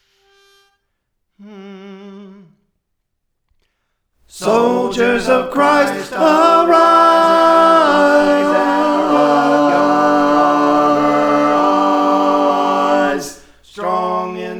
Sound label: Tenor, Praise For The Lord Training